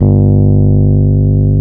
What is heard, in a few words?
Electric Pianos; Wizoo Electric Pianos